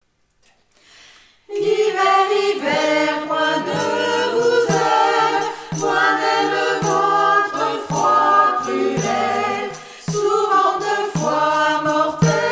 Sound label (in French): chorale hiver hiver